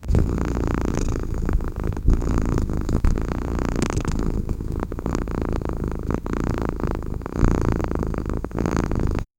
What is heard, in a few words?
cat sound